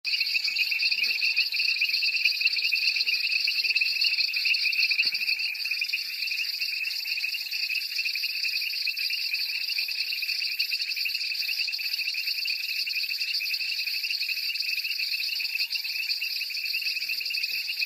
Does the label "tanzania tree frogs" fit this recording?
Yes